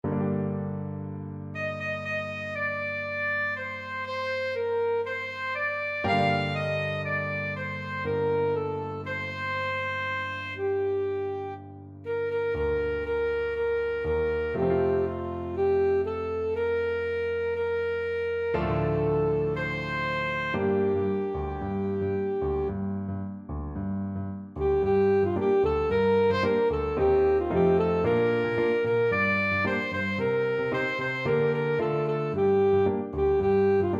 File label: saxophone